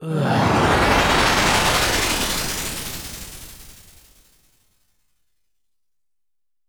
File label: Vox FX